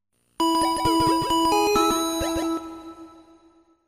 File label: healed; cave